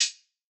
Low Hihat, Closed; trap; Hihats; Cymatics; Trap Starter Pack

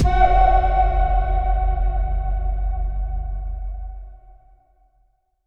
Impact; Hits

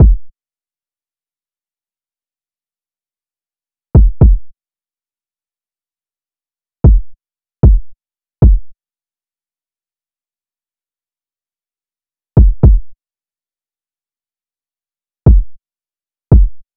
Drum Loop 114 BPM Kick; Loop Stems; Cymatics; Vibes II Drum Loop Collection; Toast

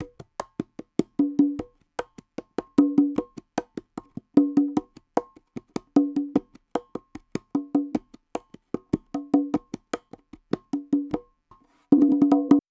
Jalisco Perc Loop - 151 BPM; Cymatics; Fiji Hihat Loops & Percussion

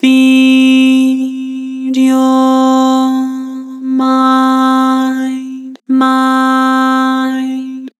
Vocals Flat Feed your mind; Harmor

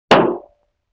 Bass Drum Rim Shot; Vintage Cartoon; Drums & Percussion

